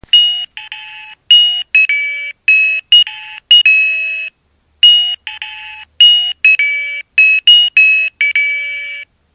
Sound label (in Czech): melodie, hodinky